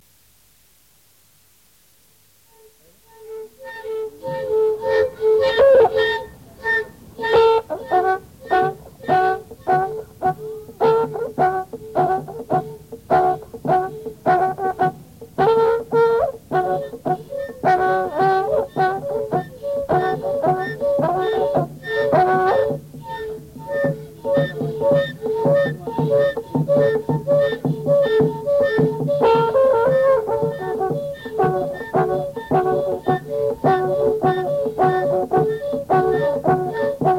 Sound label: trutruka